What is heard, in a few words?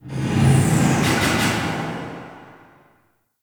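Large Cargo Room Random Ambience; Warframe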